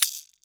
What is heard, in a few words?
FX hit; EMU Orbit-3